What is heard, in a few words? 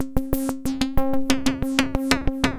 FM Perc Loop, Analog Glitch